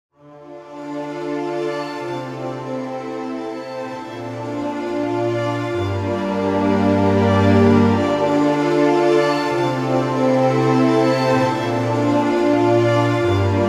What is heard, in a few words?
Bass Section and High Ensemble Textures; NOVO